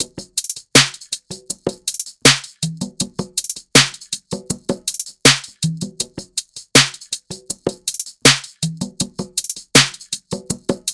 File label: tops 80, Drum Sample Pack Loops Top Loops; SD SEISMIC; Slate